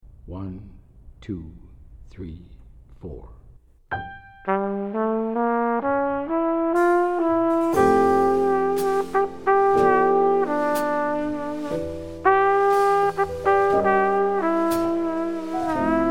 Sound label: minus Bass